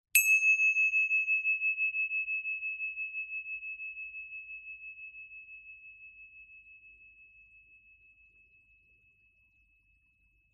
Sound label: Silence with Bells Every 10 Minutes